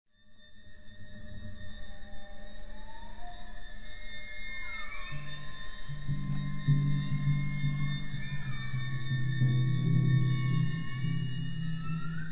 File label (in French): gliss